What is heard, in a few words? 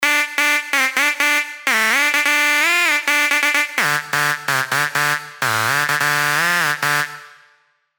LD Chop, sylenth1 dirty dutch leads; revealed sylenth1 dirty dutch leads vol 1